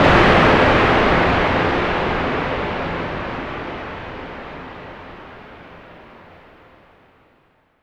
Hits & Explode

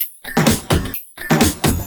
Electro Loop 128BPM; Vengeance Samples

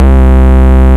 Ultimate Bass Root C; Vengeance Samples

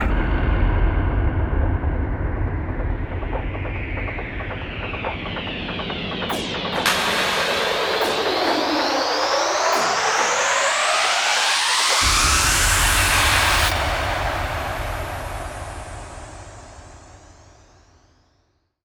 140BPM FX 8 Bars; Vengeance Samples; VUF1 Snares&FX Pairs 140BPM

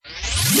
Short Fills & Reverse; Vengeance Samples

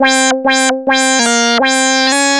ARP Odyssey, Arp A Line, synth samples

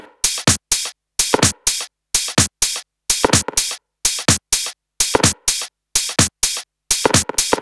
126BPM Connectors Drums, TechHouse; Vengeance Samples; Bonus TechHouse Kits

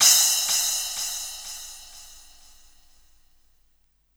Crash; Vengeance Samples; VEE Cymbals

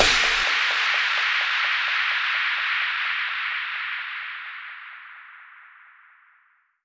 FX Downlifter

